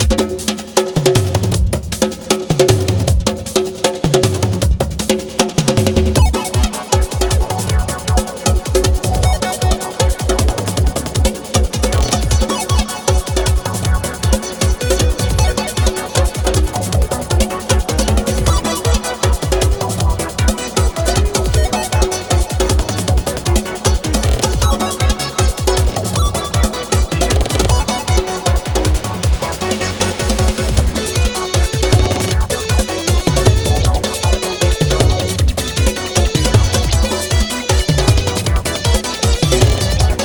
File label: surf music; The Touryst; PS4 PS5 Switch Windows Xbox One Xbox Series XS gamerip 2019